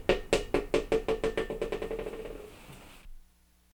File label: ball bouncing away